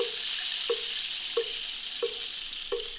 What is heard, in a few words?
Roots Reggae; Sounds Good - Roots Reggae